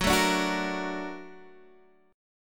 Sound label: A sharp Augmented Major 7th